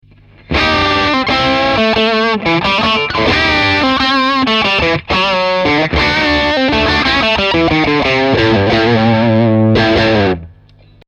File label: Strat Am Pro Dist puente